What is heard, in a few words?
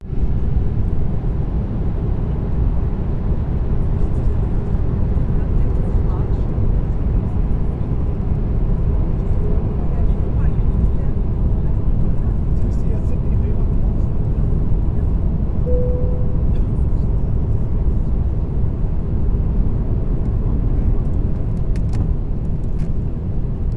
Plane Noise